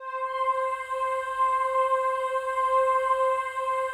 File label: PAD, ATMO PADS; Best Service; XX Large